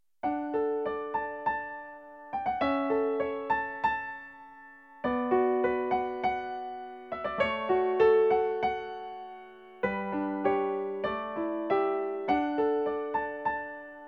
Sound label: piano only